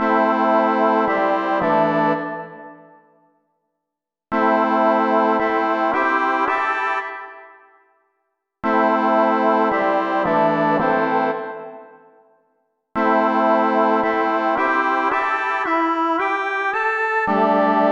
dirge; 2020 eclectic music pack

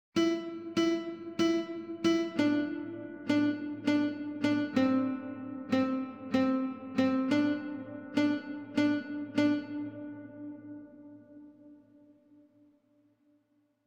Playing Guitar

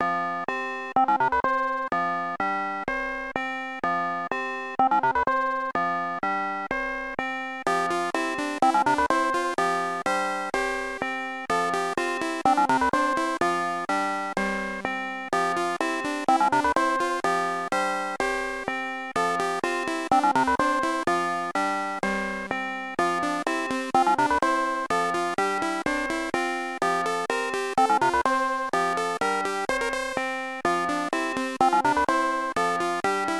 victory or wedding music with bells c64 style

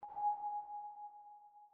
alert beep

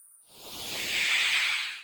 ghost

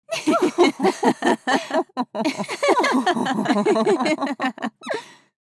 group giggling

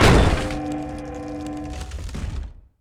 cannon hit cannon

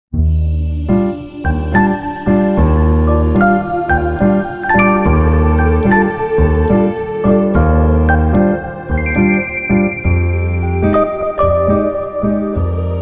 Blue Rain

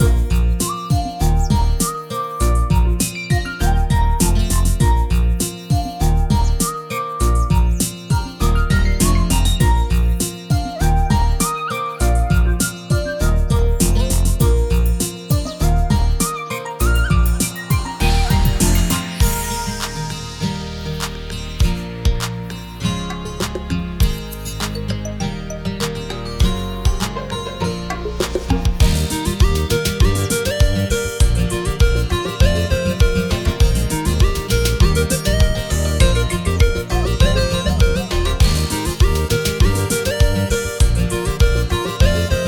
apple cider loop, tracks; Apple Cider Juiced!

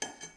fork on dish

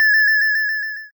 to anger blink

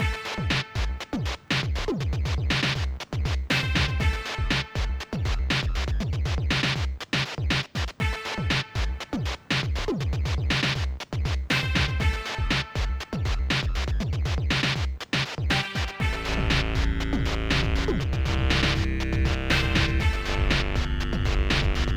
fish n chips, bleep bloop

